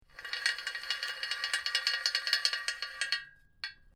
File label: Metal Chain Pulley Rattling Chains; Chain sounds